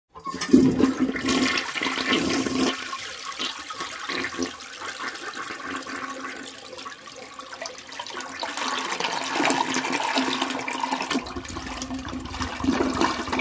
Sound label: Toilet bowl, flushing and filling